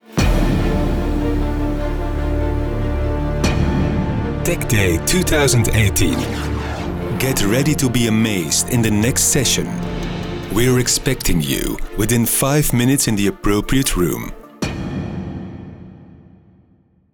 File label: eventjingle; techday 2018; studio sonart